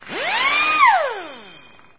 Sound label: pneumatic drill; ambient; machines; Source Engine; cstrike